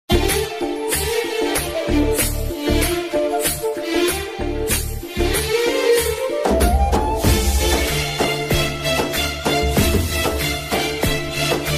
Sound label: romantic ringtone, love ringtone, mobile phone ringtone